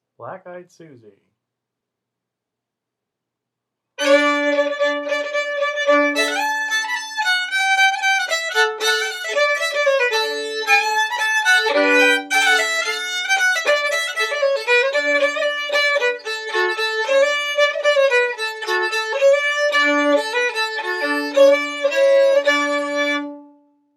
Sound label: fiddle; Black Eyed Susie